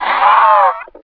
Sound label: zombie death; npc zombie; cstrike; jailbreak